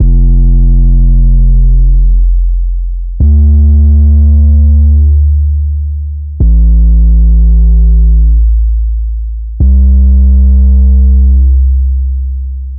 75, Am, Mirror Bass Loop

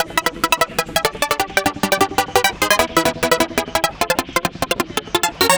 DNB Arp Bleeps

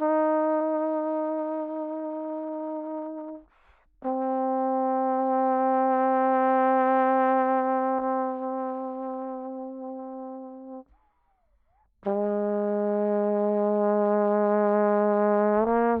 Trombone 120bpm C dark horror

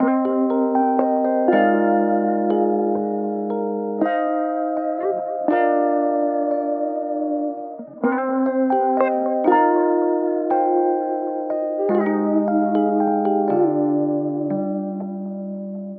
120 melodic stack Cmin; redwood road

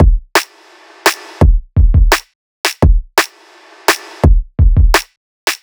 170 Drum Kick Snare

